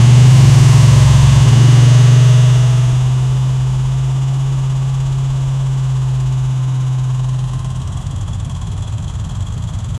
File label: toyota hilux engine, truck; 2008